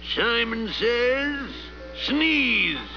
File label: sneeze